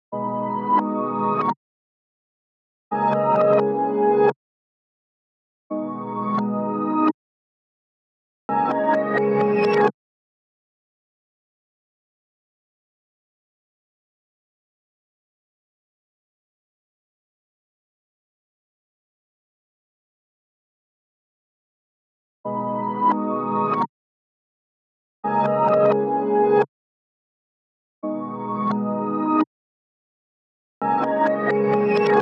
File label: 86 BPM G# Min Reverse Piano, loop stems; Cymatics; 2022 Melody Collection; Mafioso